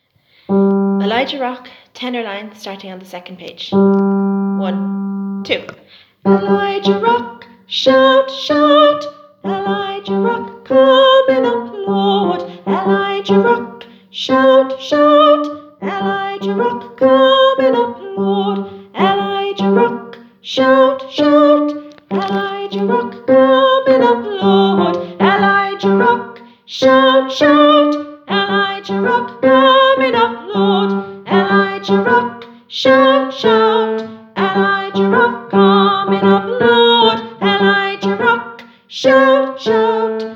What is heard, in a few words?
tenor; Elijah Rock